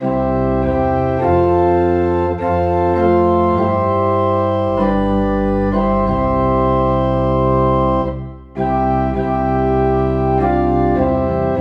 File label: Organ in C